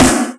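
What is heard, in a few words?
snare, Boss DR-202; Drum Machines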